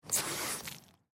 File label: Water Garden Hose Faucet Squirt Lite Splat; Yard Tools; Big Room Sound